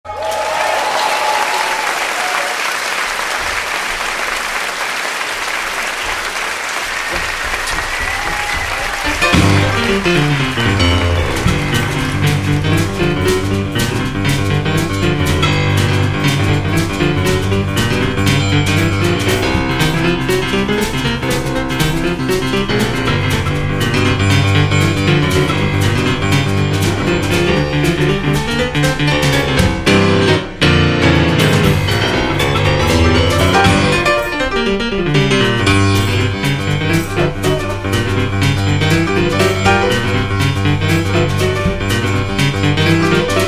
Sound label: This piano song is very fast